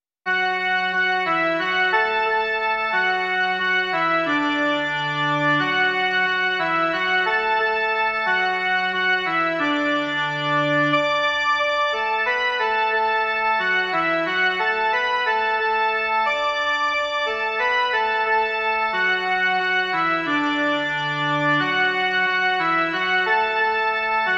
jen melodie; Stavení překrásné

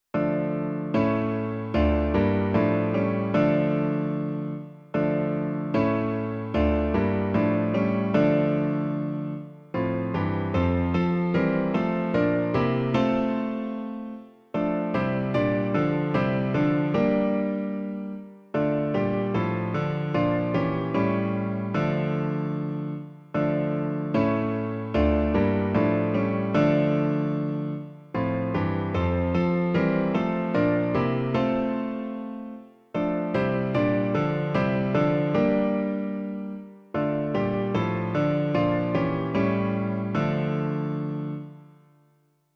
klavír; Vládce světa, Bože náš; Klavírní verze

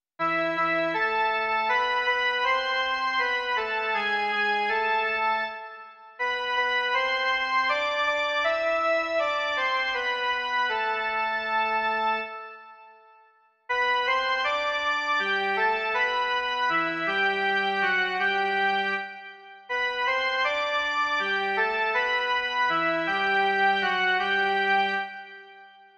Kyrie - jen melodie

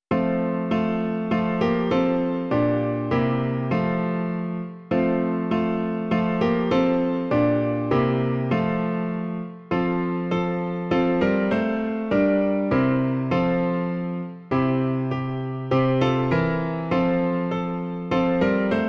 klavír; Bohoslužba začíná; Klavírní verze

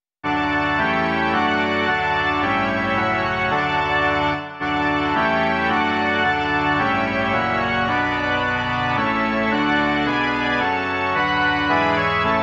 varhany; Jako hvězda v temnotě

